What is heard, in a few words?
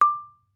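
Marimba rubber fortissimo D6; MIS Pitches - 2014; Percussion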